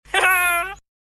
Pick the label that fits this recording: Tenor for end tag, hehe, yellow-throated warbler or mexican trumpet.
hehe